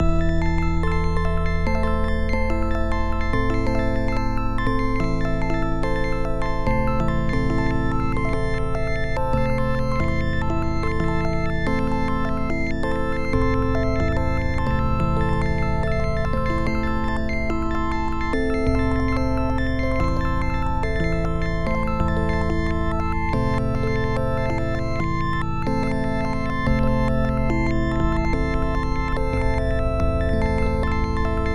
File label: Drum notes dry